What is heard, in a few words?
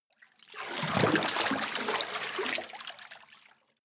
water strong